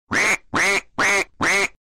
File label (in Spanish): pato cuack; animals